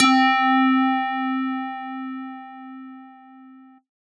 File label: Analog Organ C5, Old Skool House